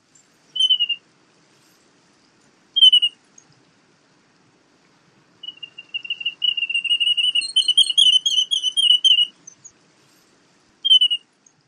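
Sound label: wandering tattler call & song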